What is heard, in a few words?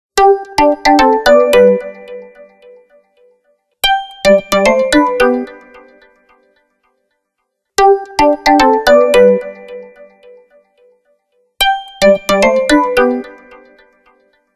Ring Tickle; HTC Touch Viva WM6.5